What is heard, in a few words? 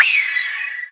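FILTER left, Disjointed Textures; USB Soundscan vol.33; AKAI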